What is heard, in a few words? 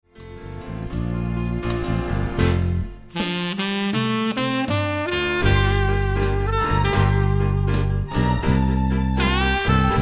elevator Music